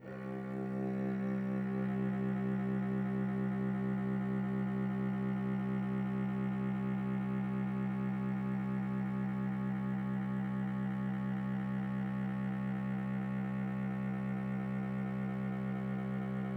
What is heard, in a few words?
Cello C